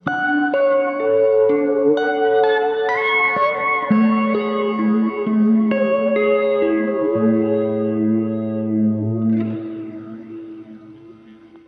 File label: Guitar; Transcend; H90